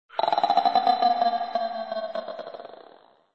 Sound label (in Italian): quack asterisco; DonaldDuck